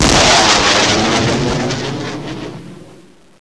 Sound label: Boss Kill; BossKillA; Jump Zampoli